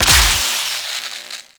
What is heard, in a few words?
Osprey Malfunction; Warframe; Corpus